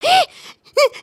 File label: freakout; emotes